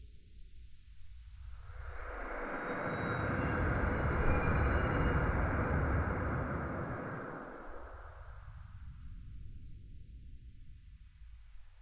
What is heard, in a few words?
landscape breathing